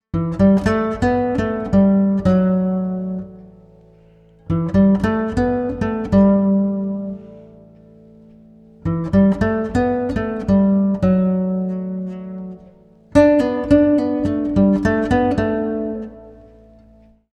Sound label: slow melody